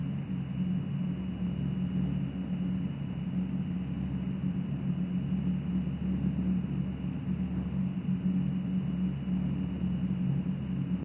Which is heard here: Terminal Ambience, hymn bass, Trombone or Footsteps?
Terminal Ambience